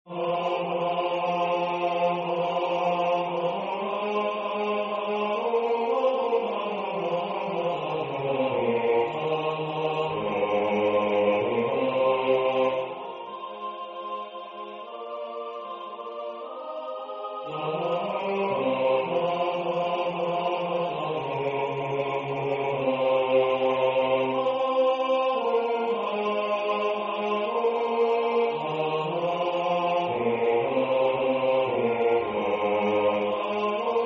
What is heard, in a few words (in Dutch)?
bas; Kristallen den fina